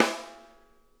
RIM, snare; Sonic Reality; Interactive Drum Kits

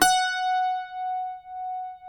cuatro F#; Spectrasonics; Hans Zimmer Guitars VOL-2

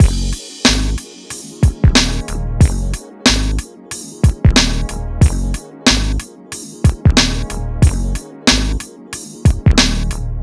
Hip Hop and R&B Drumloops

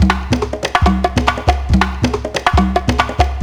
solo those bongos; Zero-G Deep House; 140bpm

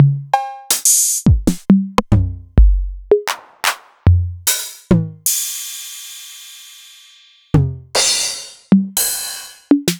Novation Drumstation

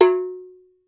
BRASS MAM, COWBELLS; Voice Crystal - Steve Reid Definitive Percussion

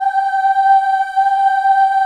G4 CHILD OH, Choir; USB Soundscan vol.28; Acoustic & Synth; AKAI

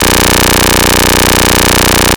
F X, PERC FX

